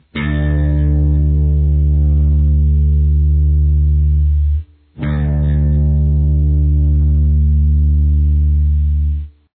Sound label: drop d; drop D tuning; tune your low E str; guitar